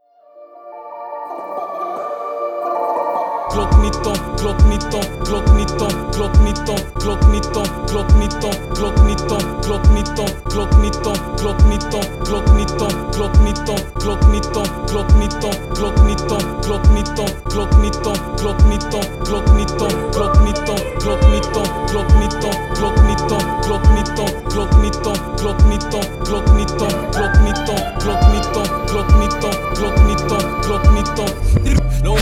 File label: glock; Sound Works